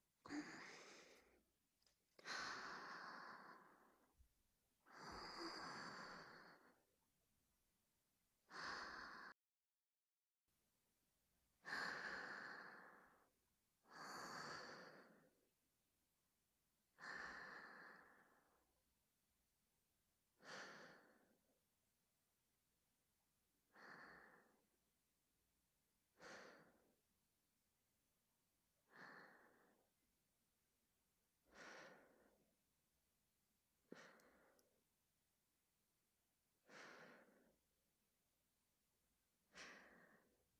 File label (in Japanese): 吐息＆寝息のみループ（囁き強化; オマケ・吐息寝息トラック; 囁き強化ver